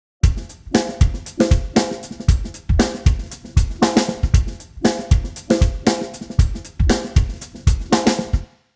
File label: drums, timestretch, 90; prosoniq time factory mpex3